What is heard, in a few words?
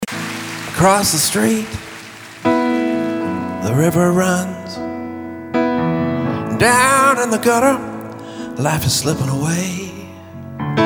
Rhythm